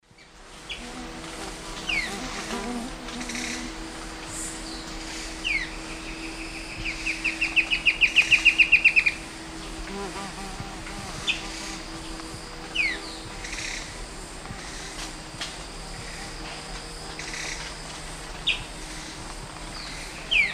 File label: Myrmotherula ignota, calls and song, S. America Eastern Lowlands